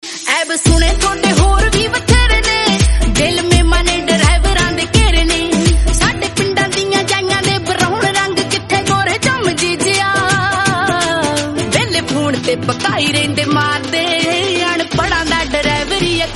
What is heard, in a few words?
Punjabi Song